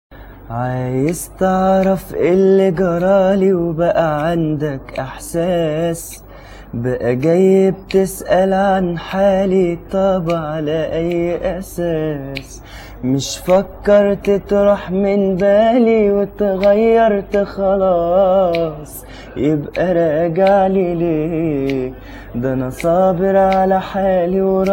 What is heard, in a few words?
solo